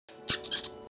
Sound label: hamster sound